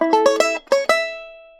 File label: banjo charge; soundboard